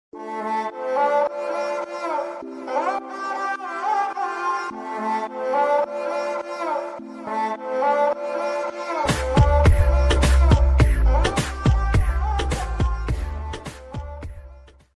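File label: instrumental ringtone caller tune ringtone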